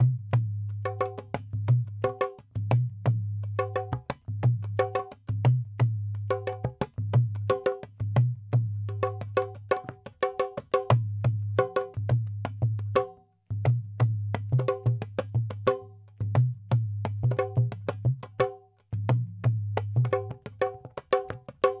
dholak 088; 088bpm; Hip Hop India Modern Percussion

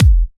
EDM Future Kick; Big EDM; Future House Tools; Kicks

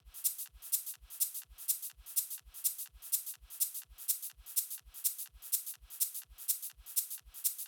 Shake loop, EDM 125 bpm; Future House; Drop; Ableton Projects; Big EDM 125 bpm (Drop) Project

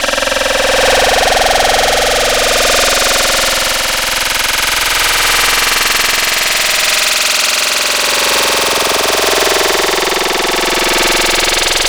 Full Vomit; Wavetables; Cymatics; Vomit for Serum Vol 2

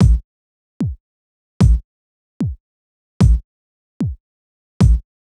Kick; Hip Hop Exotica; big fish audio; Apple Loops; Drum Tracks

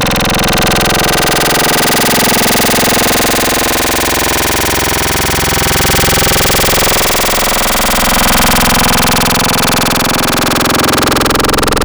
Screaming, Wavetables; Cymatics; Secrets Wavetables; Serum

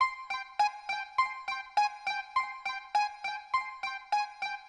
Baglamas, 102 Cmin

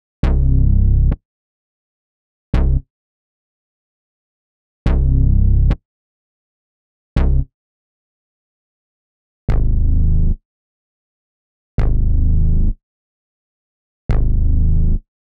Bass B

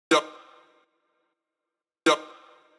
Voc loop, Big EDM Drum Loops 123 BPM; Future House; Future House Tools

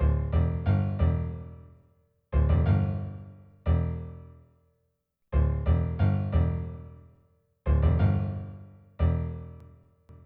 Piano, Hip Hop, 090, E min

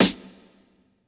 Snare Hit; big fish audio; Hip Hop Exotica; Bmin

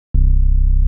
Bass; Hip Hop; Apple Loops; Big Fish Audio

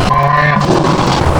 Rhino, Wavetables; Cymatics; Serum; Animals for Serum - Gold Edition

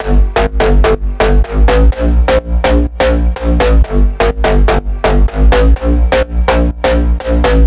Big EDM Bass Loop G1; Future House; Future House Tools; Bass Loops

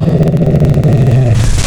Cat, Wavetables

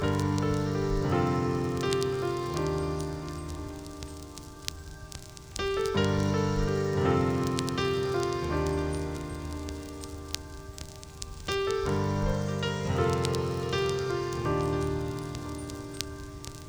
Full Chords, 81 bpm; StudioLinkedVST Vinyl Touch; Kontakt; hiphop; Progression 08

